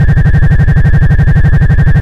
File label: engine; Kenney's Sound Pack; Retro Sounds 2